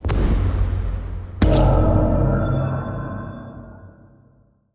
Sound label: ui draw lots failure